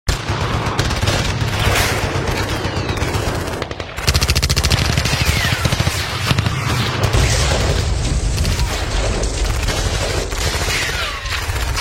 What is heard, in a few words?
War or Battle Sound Effect